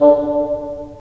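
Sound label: wind bassoon; LA Composer vol. 3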